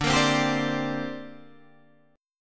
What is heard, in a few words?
D 7th Suspended 4th Sharp 5th down Guitar Half Step Down